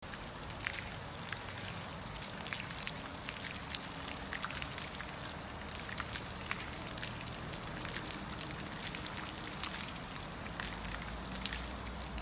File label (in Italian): Fontana; museo Tinguely Basilea